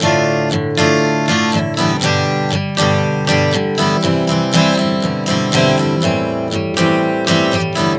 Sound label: Dm-G-C-Am 120 bpm, acoustic guitar chord progressions